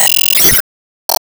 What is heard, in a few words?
Caution; disney star smash android ios mobile gamerip 2020